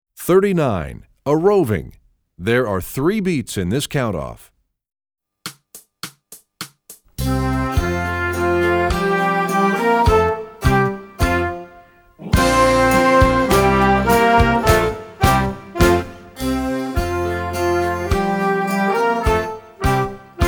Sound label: trombone; essential elements